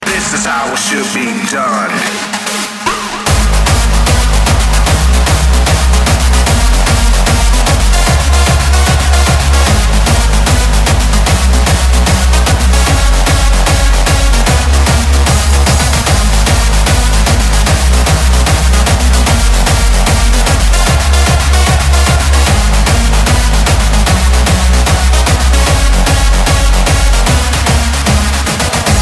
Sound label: Bass Hardstyle